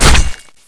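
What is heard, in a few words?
Damage Bow Hard; Seer; GamigoNA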